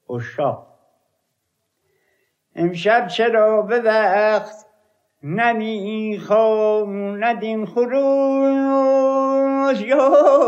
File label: oshagh, rast panjghah